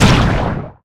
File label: Blood Splat Gib; SFX Kit; Foley - Misc